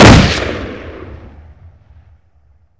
automatic shotgun fire; gesource; weapons; autoshotgun

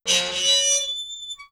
fan squeak; gesource

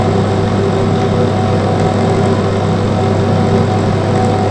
fan loop; gesource

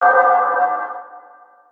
button click release; gesource

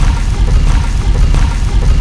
stone slide, doors; gesource

